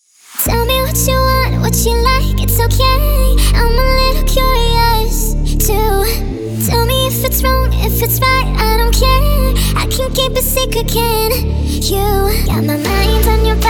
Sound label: HARDSTYLE